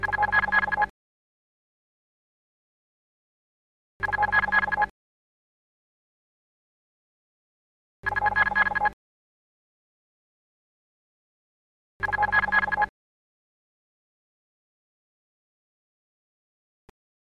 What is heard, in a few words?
ring; falken; Global Frequency